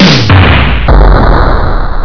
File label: snaredrum; Instruments; Goon Squad